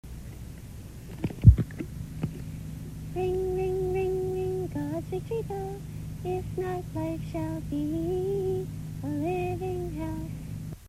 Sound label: bell; Gods Victory bell; ring